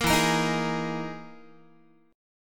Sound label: E Major 7th Flat 5th down Guitar; EM7b5 strummed